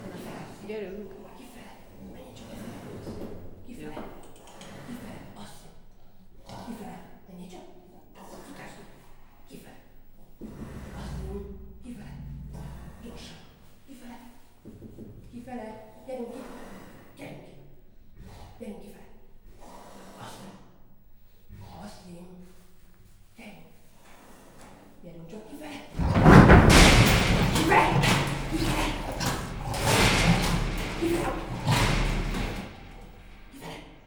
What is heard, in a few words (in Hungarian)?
nekiugrik a rácsnak, perzsa leopárd; miskolci zoo 2018